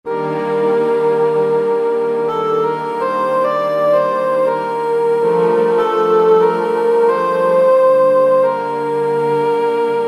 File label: Dialog Underscore, Kurzweil K2000 Orchestral